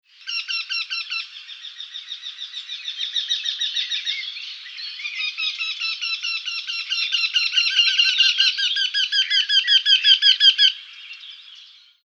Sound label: Jynx torquilla